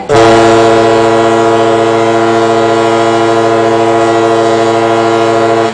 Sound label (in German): tuuut, Seebaederschiffe; Wappen VHH